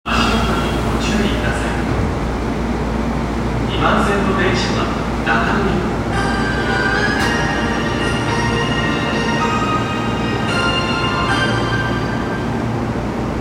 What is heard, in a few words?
train sounds; 東西線落合西